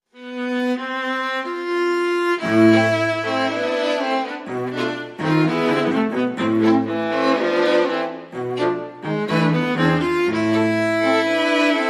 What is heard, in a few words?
Tango